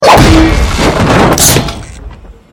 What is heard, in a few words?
sigil slam explosion; billy ed edd n eddy sfx